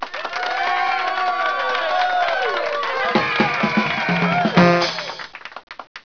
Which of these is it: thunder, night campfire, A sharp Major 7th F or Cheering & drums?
Cheering & drums